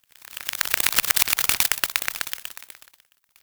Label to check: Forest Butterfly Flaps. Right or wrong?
Right